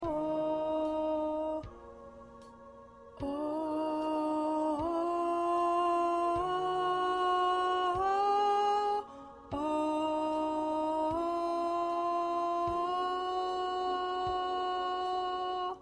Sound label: Backup Alto